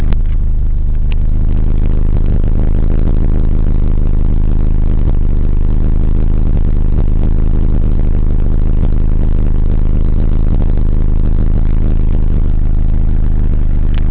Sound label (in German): Standgeraeusch; astra